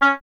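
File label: WIND OBOE; Miroslav Vitous; Solo Instruments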